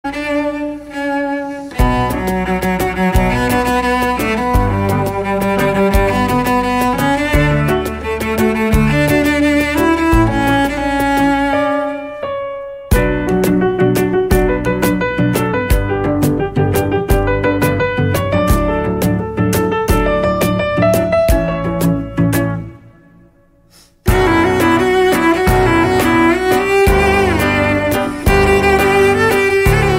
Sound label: Cello Piano